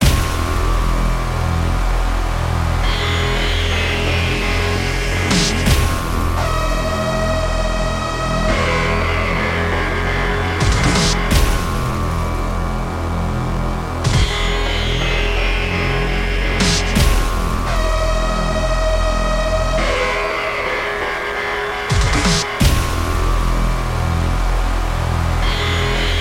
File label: boss intro